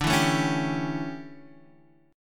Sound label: D Major 7th Suspended 2nd Suspended 4th Guitar Standard, chord; strummed